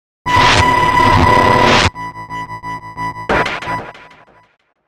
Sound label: Digital Feedback Surge Fuzz Impact; 5.1 STEREO MIX; Blastwave